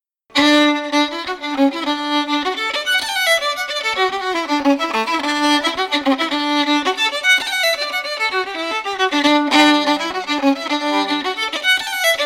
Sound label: reel fiddle; mari maclennans